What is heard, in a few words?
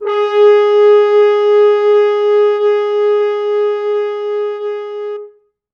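French horns soft mutes, four, G#3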